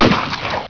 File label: BALLOONS, inflate